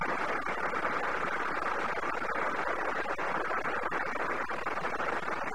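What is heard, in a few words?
Motorola Type-II Fast, 3600 baud data stream; Current Motorola Control Channel (SmartZone)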